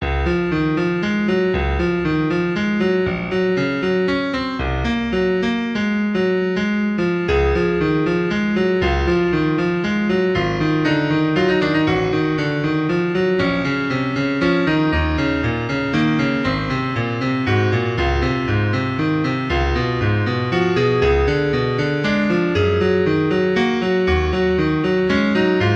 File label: 2nd movement b D Major